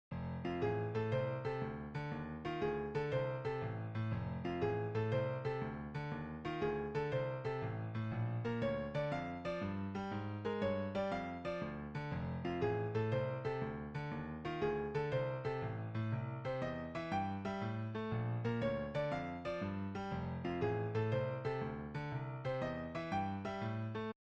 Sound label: Simple Blues In F; 16Blues